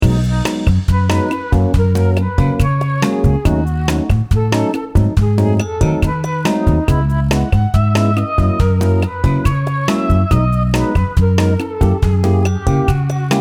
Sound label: menu music loop